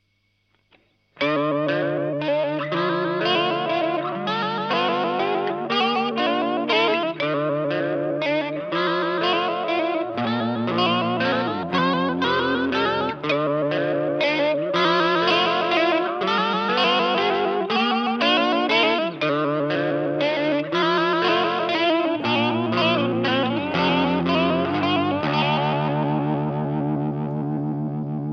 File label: Earthquaker Devices Aqueduct Square